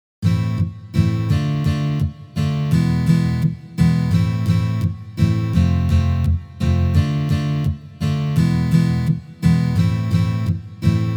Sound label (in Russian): boya